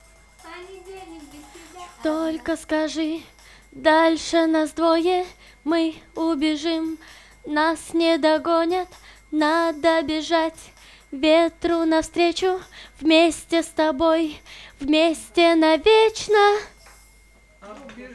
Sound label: VOX Couplet, acapellas